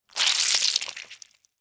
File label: Squish Suction, Texture, Squish, Suction, Wet, Juicy; Squishes; Objects; BlastWave